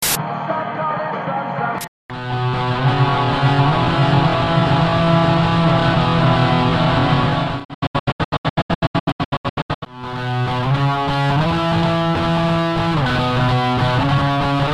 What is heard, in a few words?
punk beat